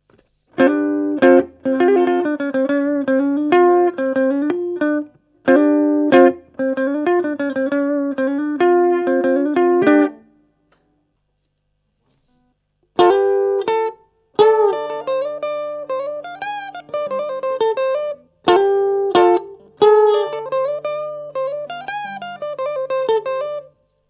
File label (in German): standard hp clean mitte coils split